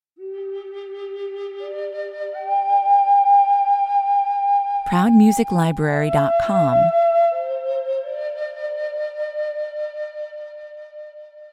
lost relic (no drums) 84 bpm

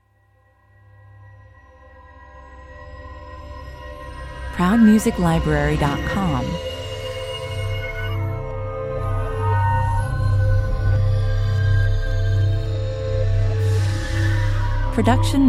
exotic mystery suction opener; free bpm

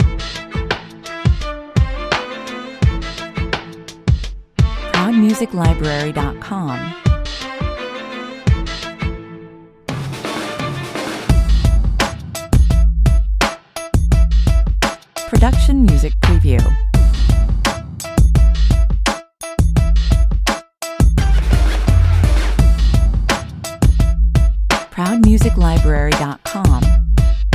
funky, 85 bpm

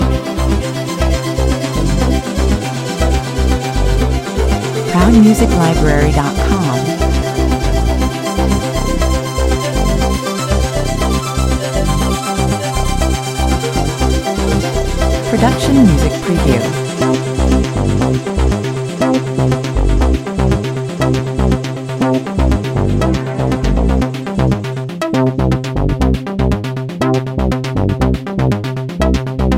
goa techno loop

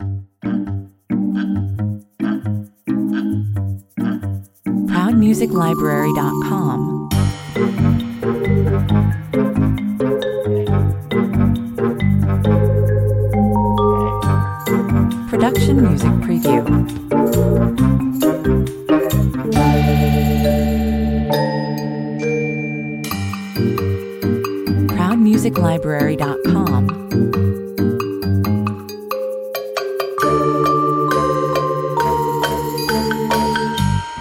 Dynamic, quirky, exciting and fun!

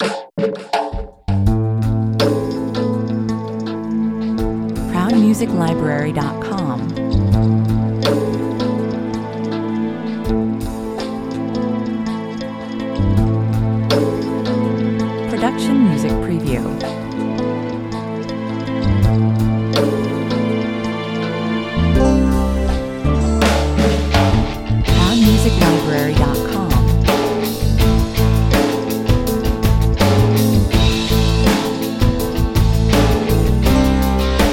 falling raindrops 164 bpm

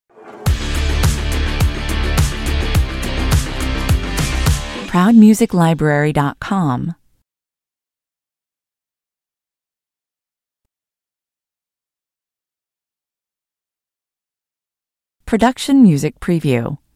stinger

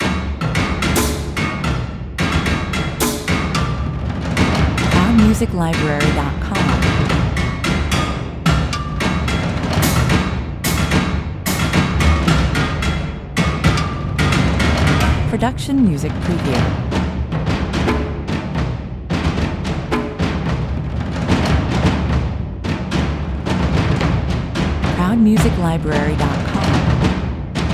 commercial

